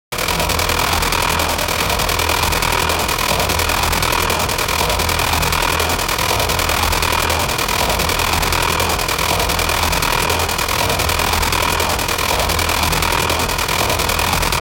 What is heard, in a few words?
gravity gun sound loop